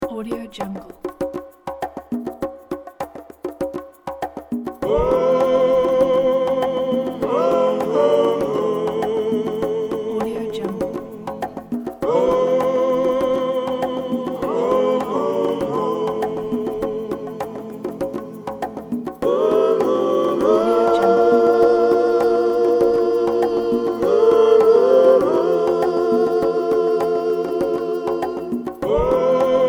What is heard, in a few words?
a happy soul